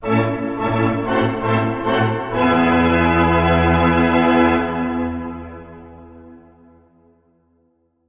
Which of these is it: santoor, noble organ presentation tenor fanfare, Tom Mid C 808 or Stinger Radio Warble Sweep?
noble organ presentation tenor fanfare